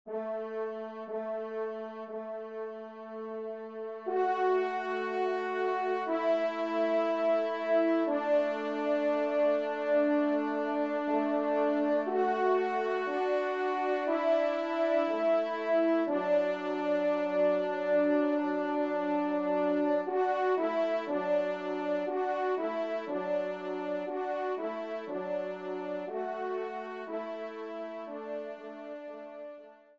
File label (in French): Cloches du Village, trompe; Emile Rolle